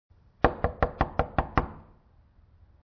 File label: call